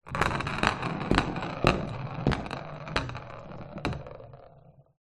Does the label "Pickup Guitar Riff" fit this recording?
No